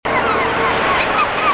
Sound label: Meowen